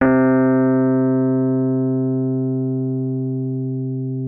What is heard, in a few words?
C3, RHODES; Session Instruments; Best Service ProSamples vol.42